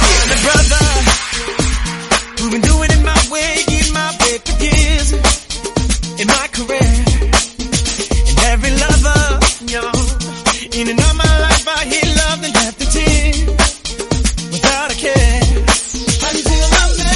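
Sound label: 115 bpm B flat minor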